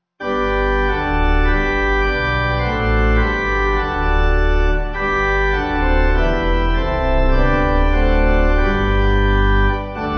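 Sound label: Pipe, Pipe Organ backing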